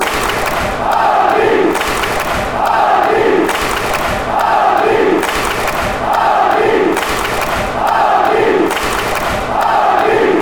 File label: clap clap clap, chants; hibees